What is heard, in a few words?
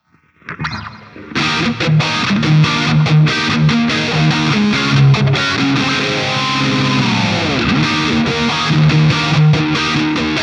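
EVH Custom78, guitar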